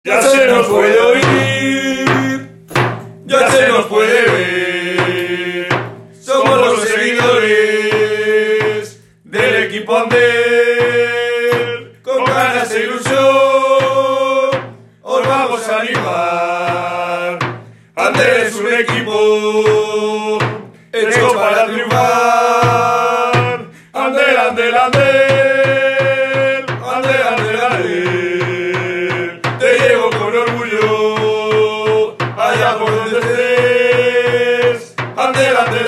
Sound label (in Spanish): Himno Musica; Himno Andel